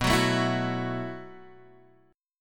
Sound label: B 7th Suspended 4th B down Guitar Half Step Down; B7sus4